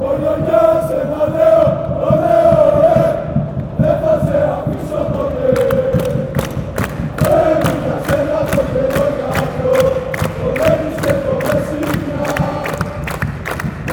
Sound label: aris chant